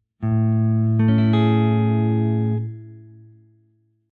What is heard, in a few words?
A maj 7, A Major 7 chord, guitar; triad substitution; This can be used in place of any A major triad